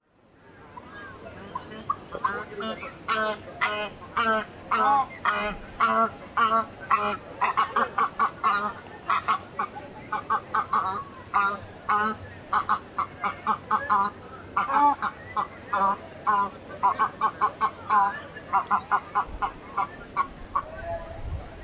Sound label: australian white ibis